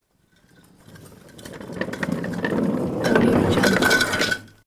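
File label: mine cart on board start rolling close up derail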